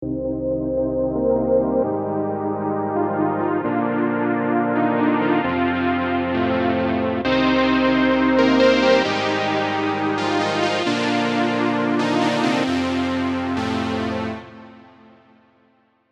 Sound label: Juno 6 Power; vintage synth roland srx keyboards